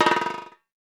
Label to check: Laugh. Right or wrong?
Wrong